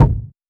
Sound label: kick one shot box; Kicks